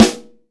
METAL, LUDWIG SNARE

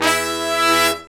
BRS SHRT, R&R Horns, Sect; Roland L-CDX-03

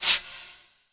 Brush Swirl, Snares

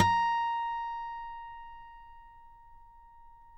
HARM A#5, Guitars; Spectrasonics; Hans Zimmer Guitars VOL-2; FNGSTYLE PFM

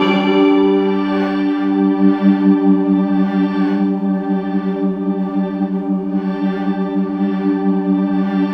MANTRA, STRATICUS; Spectrasonic Distorted Reality 1

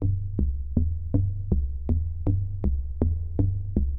SH101 Bass Loop (120BPM), retro house

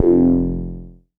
bass F1, Korg MS-20; Polyend; Simulacrum Sounds; 1 Shots; Synths

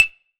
perc mod tink

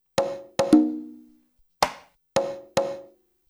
LOOP, CONGAS, Percussion

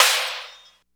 DR660 DANCE, DRUMS PERC; BOSS; Rubber Chicken Software Sample Bank

